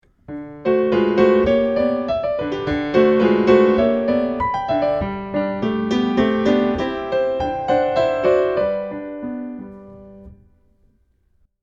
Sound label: Contradanse; Beethoven